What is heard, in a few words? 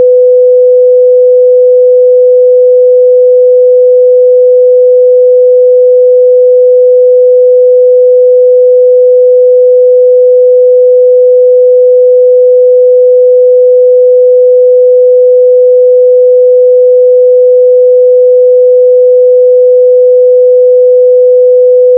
sine 500; alsa